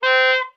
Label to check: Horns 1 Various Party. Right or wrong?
Right